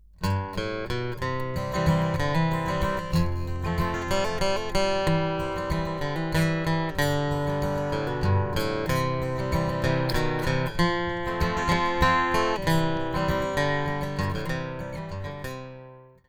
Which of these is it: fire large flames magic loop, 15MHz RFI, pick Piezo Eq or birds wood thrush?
pick Piezo Eq